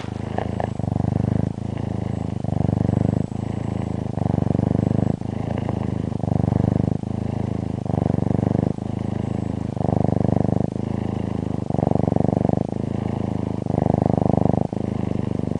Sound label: purr